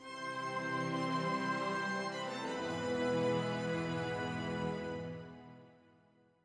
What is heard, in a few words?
Stinger; Victoria 3